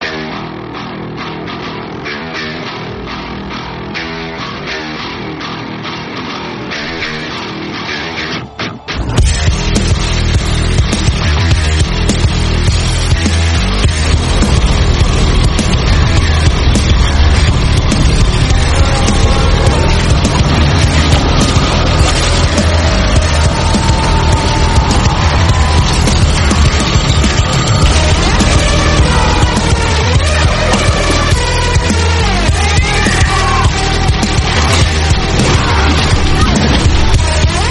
music english bgm

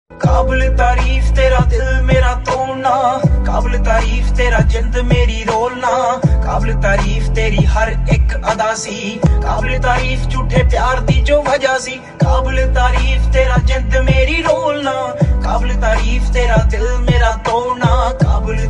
punjabi song

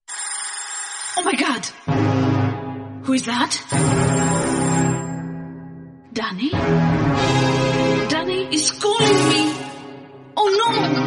suspense; danny is calling

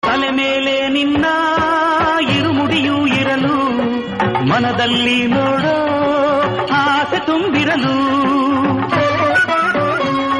ayyappa swamy kannada song

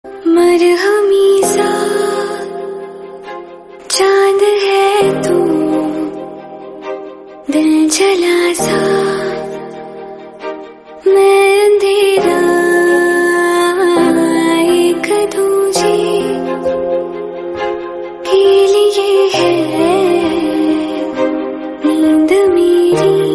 female version sad hindi

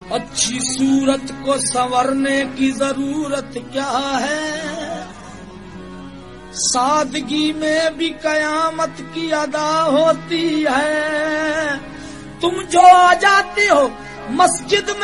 sargam bgm theme

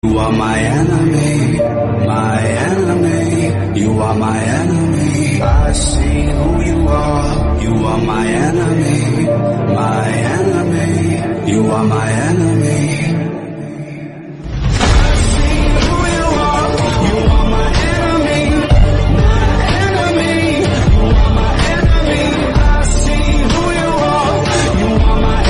remix bgm english

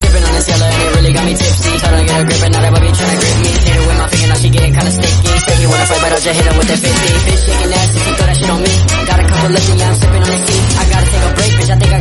electro swing remix

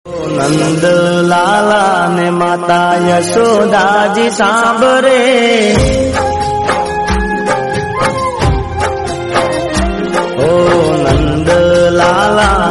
gujrati devotional song

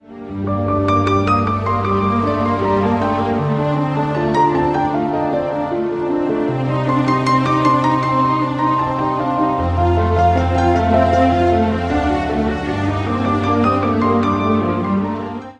Instrumental

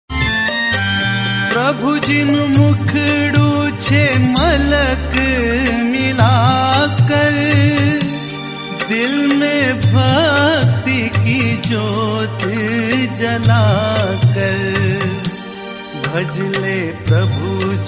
flute

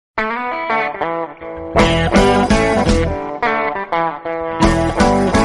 blues guitar solo

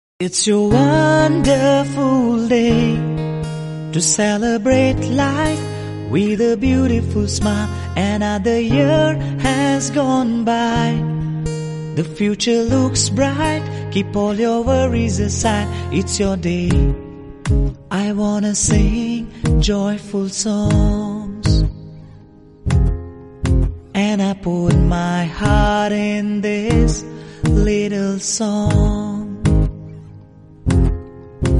happy birthday my son english

